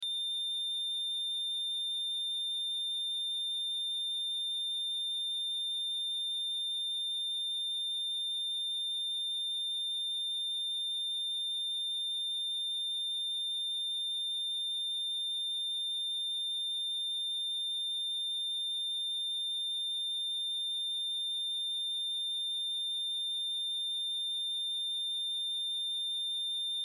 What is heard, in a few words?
Heart Monitor Flat Line Beep Loop; Heartbeat; Emergency